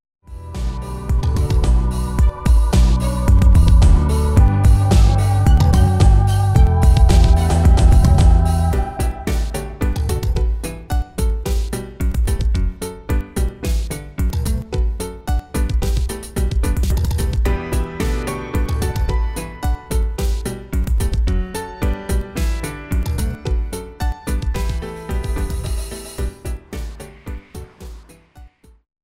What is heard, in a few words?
piano process